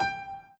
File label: Piano Chord